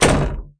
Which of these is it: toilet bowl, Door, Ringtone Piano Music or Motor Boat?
Door